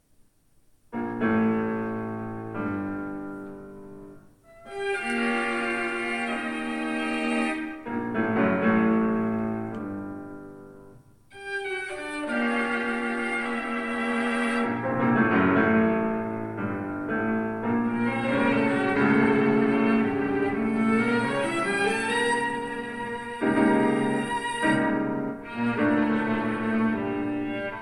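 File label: piano sextet, Piano Sextet in B flat Major; boisdeffre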